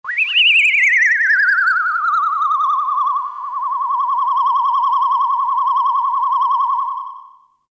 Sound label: whistling sweep